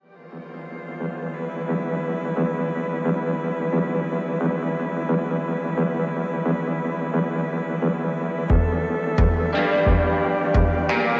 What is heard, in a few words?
Cello Bender